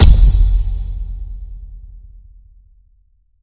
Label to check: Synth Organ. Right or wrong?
Wrong